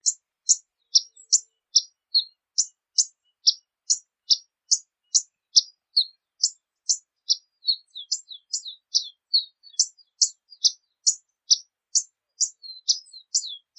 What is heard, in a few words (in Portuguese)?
Canto do beija flor de orelha violeta